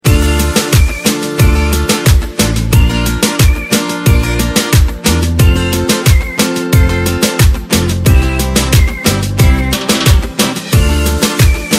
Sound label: remix instrumental